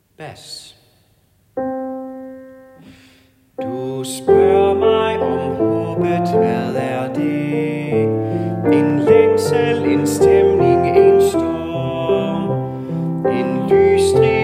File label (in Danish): bas; laudate kirkens folkekor